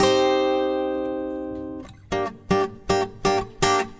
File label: simple acoustic guitar; GUITAR LOOPS